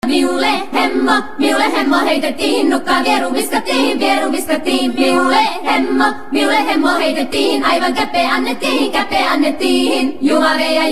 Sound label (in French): chant